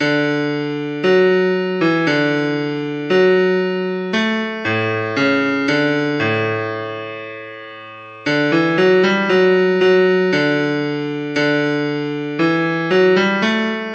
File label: Bass